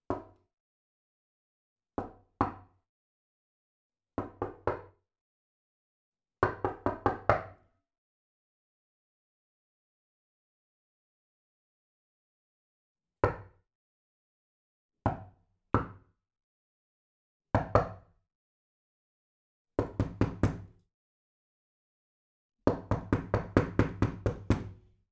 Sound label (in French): PORTE CHAMBRE BOIS TOC TOQUER INTERIEUR